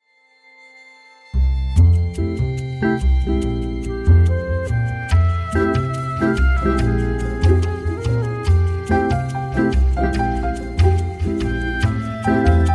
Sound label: Conference Call Samba